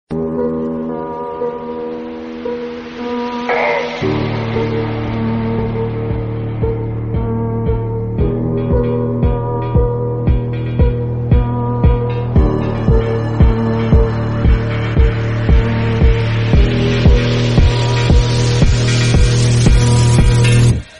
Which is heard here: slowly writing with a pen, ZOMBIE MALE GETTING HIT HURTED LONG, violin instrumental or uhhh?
violin instrumental